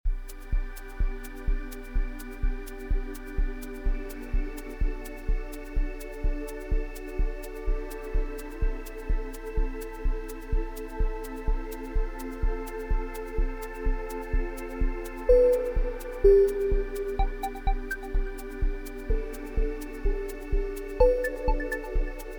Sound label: light shopping loop